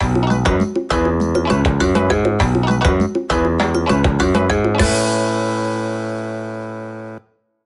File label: Level Jingle; The Savanah